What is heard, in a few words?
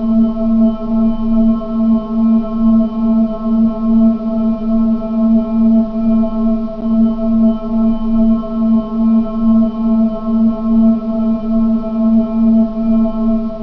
high drone